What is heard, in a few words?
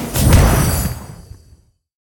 lock it multiplier award; narcos